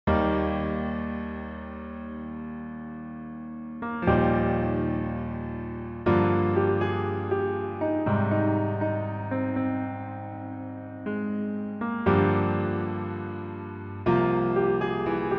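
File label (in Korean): Piano version 멜로디MR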